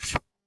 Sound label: card flip